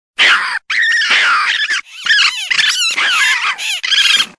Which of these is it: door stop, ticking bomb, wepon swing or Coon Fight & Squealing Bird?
Coon Fight & Squealing Bird